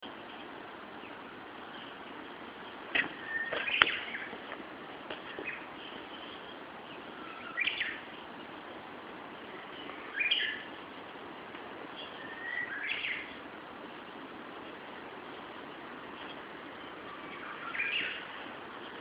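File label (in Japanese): uguisu, Japanese bush warbler; akkyan 2004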